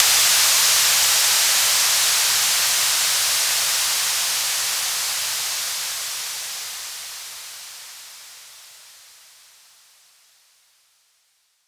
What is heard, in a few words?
DOWNLIFTER 128BPM